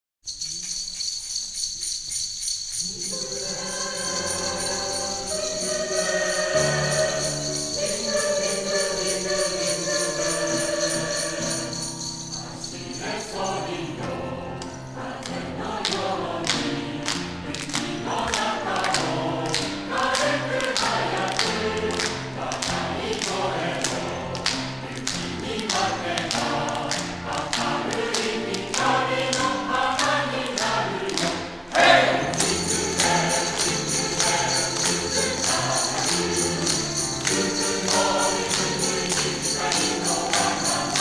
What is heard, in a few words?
Jingle Bells; ジングルベル J.ピアポイント作曲; 全体合同演奏